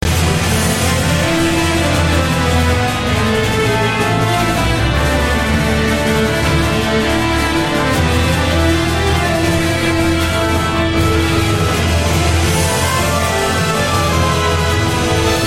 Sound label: Orchest; Last Battle